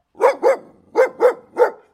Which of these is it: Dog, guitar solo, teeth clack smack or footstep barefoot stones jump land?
Dog